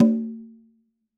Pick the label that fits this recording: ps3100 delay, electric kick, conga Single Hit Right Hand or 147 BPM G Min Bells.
conga Single Hit Right Hand